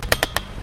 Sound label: clicking